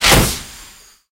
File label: aerobatics smoke start; War Thunder